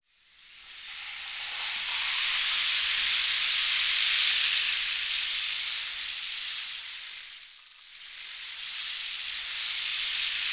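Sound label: rain stick; Spectrasonics; Heart of Africa 1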